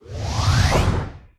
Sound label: pick me not chosen; vegas night life